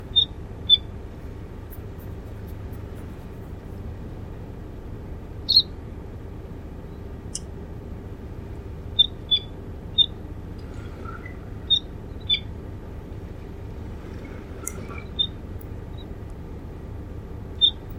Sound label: least tern, birding by ear, Bonaire